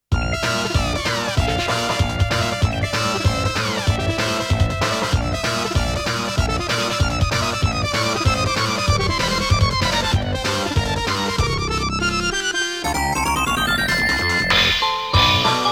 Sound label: BGM, Desert; pulirula